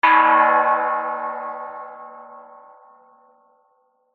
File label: impacts metal tap lightly metal canister resonate